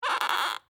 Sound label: Wood Creak Squeak, Old, Handle